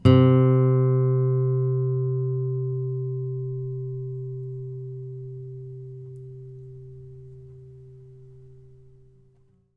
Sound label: bass, ostinato bass; 1 bass